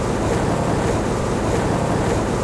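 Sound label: elevator Hangar loop; theed; Star Wars Obi-Wan; XBOX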